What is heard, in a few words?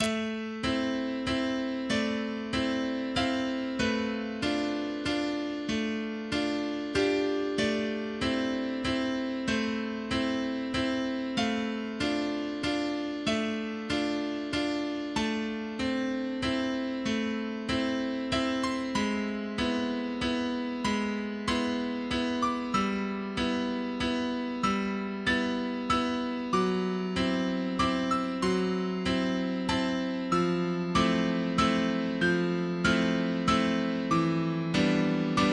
Blood Waltz, MIDI